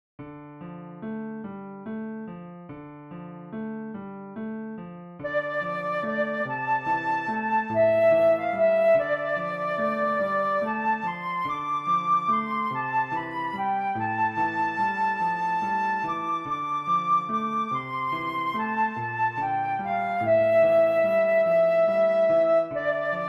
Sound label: scarborough fair flute and piano; Traditional